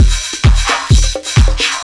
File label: dirty swish; Zero-G Deep House; 130bpm